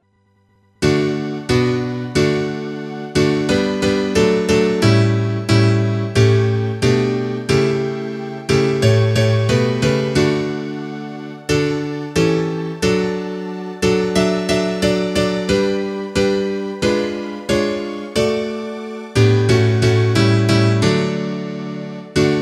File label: instrumental